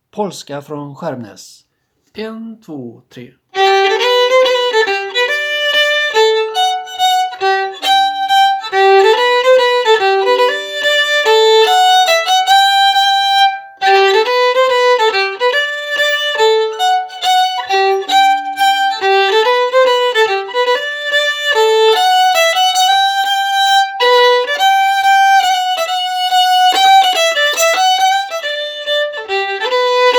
Polska fran Skarmnas

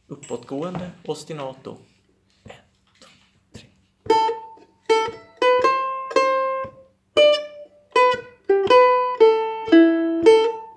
Polska fran Jamtland, ostinato

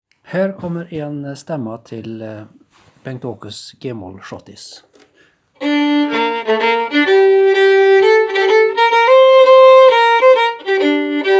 Schottis i g-moll

